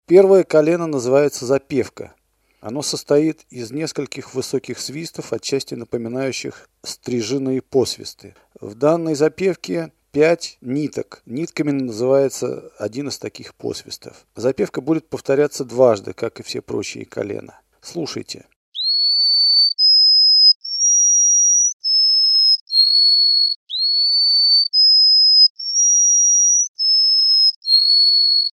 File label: zapevka, canary